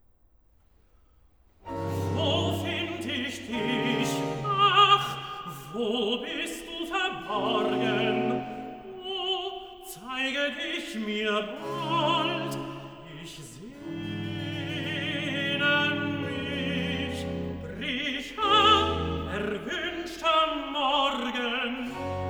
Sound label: Cantata, Recitativo; BWV 175 'Er rufet seinen Schafen mit Namen'; 'Wo find ich dich'; Bach; Blythburgh; Whit Tue